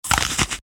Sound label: Apple Bite